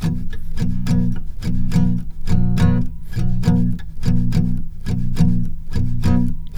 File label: C 3 R, Guitars, SLOW SHUF; Spectrasonics; Hans Zimmer Guitars VOL-1